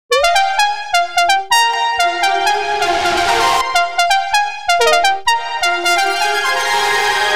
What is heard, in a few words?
128 BPM F Min Lead; House; Drop Loops; Cymatics; Theater